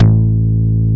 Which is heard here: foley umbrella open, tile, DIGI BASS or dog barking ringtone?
DIGI BASS